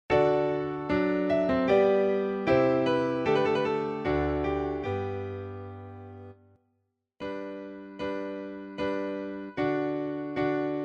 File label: Ombra Mai Fu Audition PIANO Accomp.